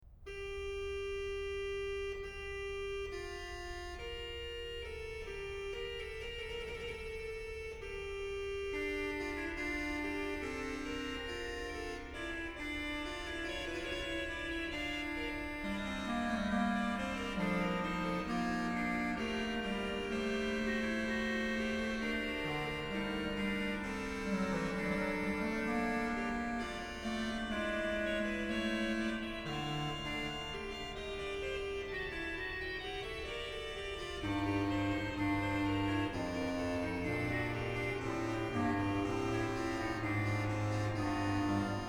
Fughetta, BACH ORGAN; Chorale Preludes; BWV0699; Nun komm, der Heiden Heiland

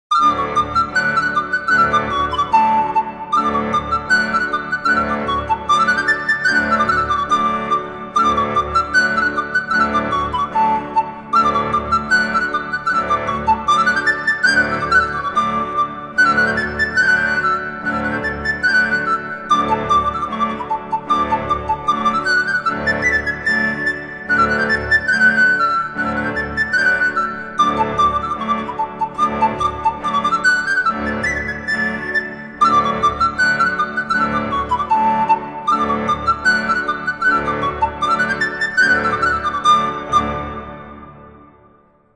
Tambourin, Pan flute music; M.R. Delalande

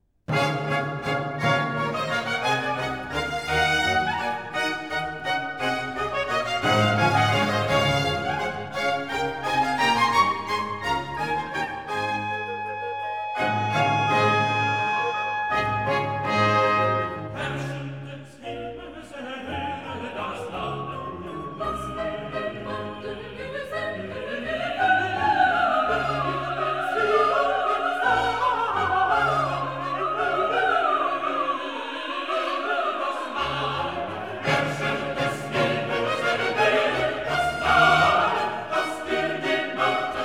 Chorus da capo; Herrscher des Himmels erhцre das Lallen; BACH; Christmas Oratorio

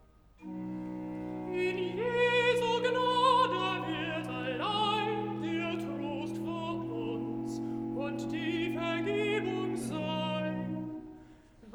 Recitativo (Alt), BACH for ALTO; BWV038; Aus tiefer Not schrei ich zu dir; In Jesu Gnade wird allein

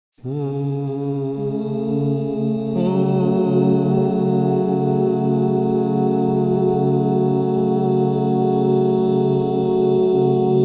Fa-diez minor, crystal bowls; Yoga and sound

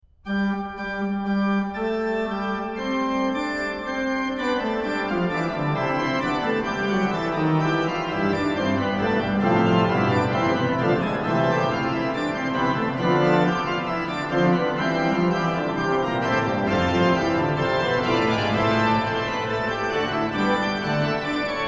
Fughetta, ORGAN; BWV0697; Fughetta sopra Gelobet seist du, Jesu Christ; BACH; BACH ORGAN WORKS; CHORALE PRELUDES

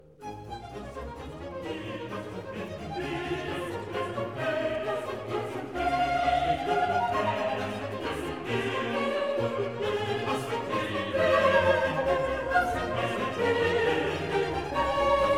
Chorus; Lasset uns nun gehen gen Bethlehem; BACH; Christmas Oratorio

